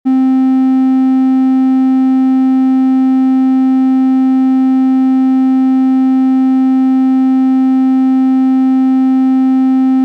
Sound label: C4 261 60